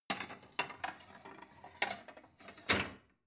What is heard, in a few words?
plate multiple shelve soft